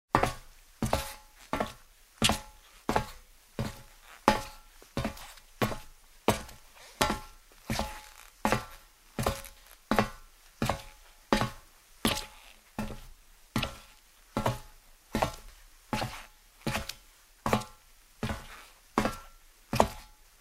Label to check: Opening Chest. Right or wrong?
Wrong